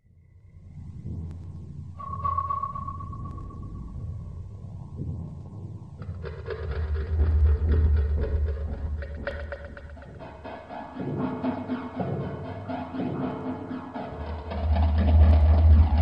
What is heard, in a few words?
F Chromatic 60